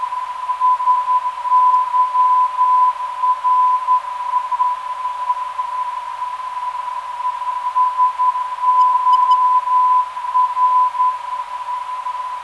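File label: non-directional beacon; SYR; 416.6kHz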